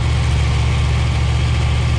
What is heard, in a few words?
engine; Tiki Kart 3D